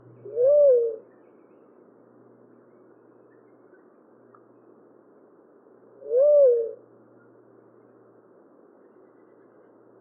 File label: Tolima Dove Leptotila conoveri